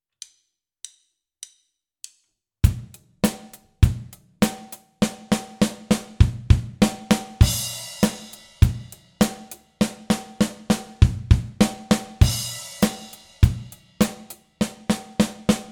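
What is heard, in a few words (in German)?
fills mit bd, bass drum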